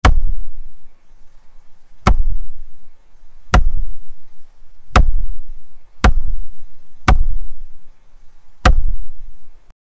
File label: singular ball kick sound; physics